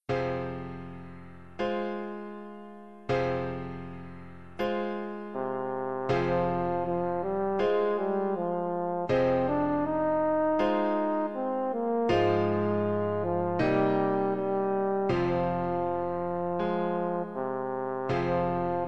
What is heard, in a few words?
Song without words, french horn; Holst